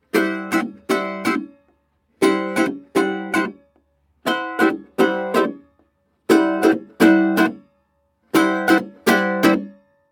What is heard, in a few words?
riff; olofonia